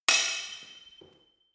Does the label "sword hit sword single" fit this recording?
Yes